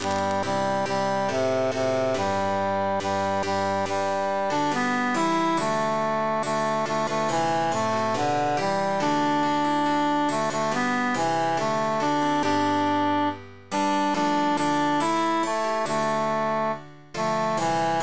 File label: tenor emphasized; now is the month of maying